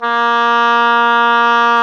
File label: OBOE, mf f; Roland L-CDX-03 Disk 1; Oboe 2&3